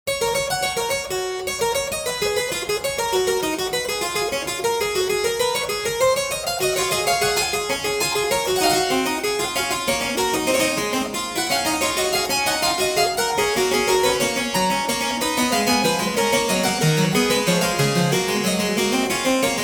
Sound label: Preludium und Fugue en Sol Mayor